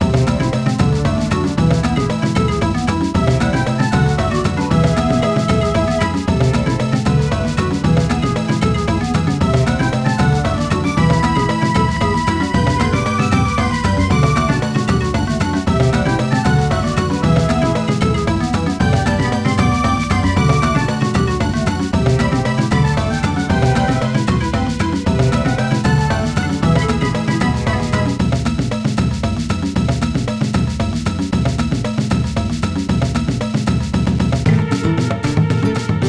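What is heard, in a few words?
background music level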